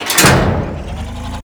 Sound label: Injection Chamber Tube Mover Start; Ambience; Warframe; Grineer Ocean; Ocean Sabotage Injection